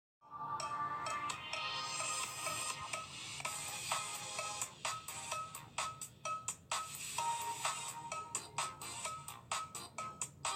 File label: Bell; Fortnite Llama